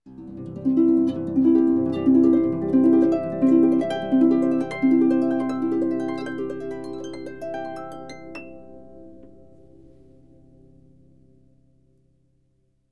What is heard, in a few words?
Arpeggio Harp; Instrument Sounds